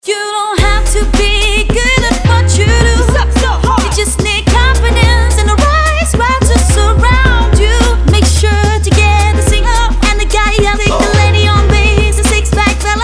Ringtone, Android

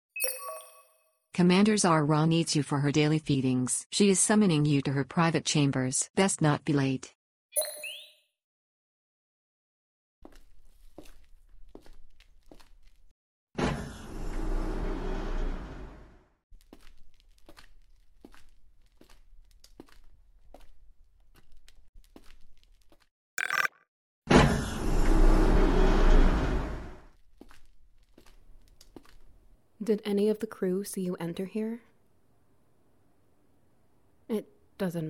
SFX Wet Noises; An Orgasm a Day keeps the Starvation Away

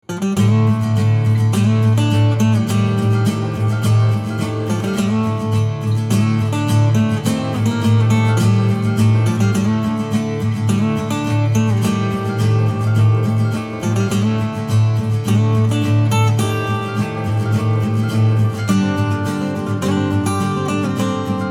Acoustic

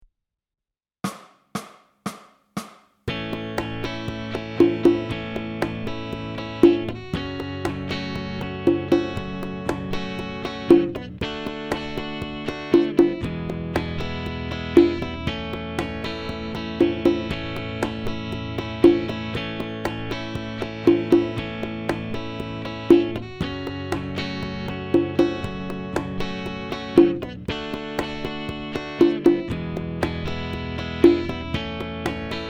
Backing Track

